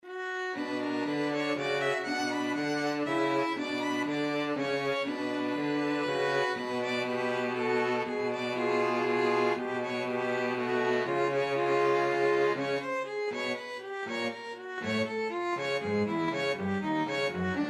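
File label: peer gynt strings, 2 violins cello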